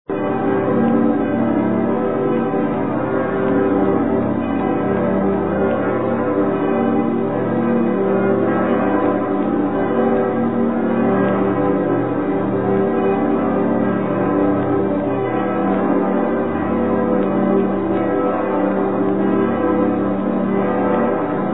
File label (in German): domglocken